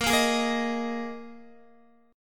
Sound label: Bb 7th Suspended 4th Bb Guitar 4F,4A,5C,5G,5C,6E; strummed